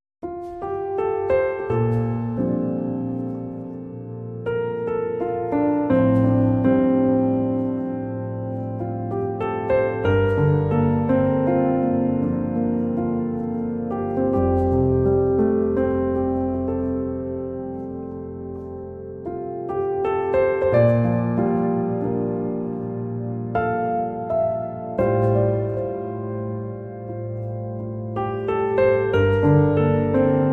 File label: Violin